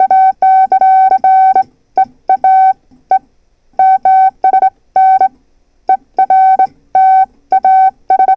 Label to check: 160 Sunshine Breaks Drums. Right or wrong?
Wrong